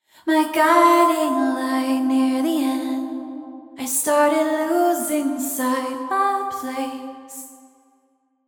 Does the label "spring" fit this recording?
No